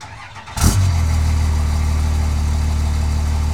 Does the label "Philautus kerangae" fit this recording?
No